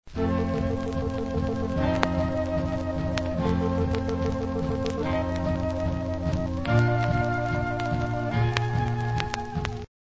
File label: Unfiltered Excerpt Orchestra; RCA Victor